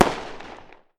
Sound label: burst sm, firework